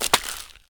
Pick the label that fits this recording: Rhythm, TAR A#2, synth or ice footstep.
ice footstep